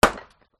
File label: industrial axe chop strike wood single; zapsplat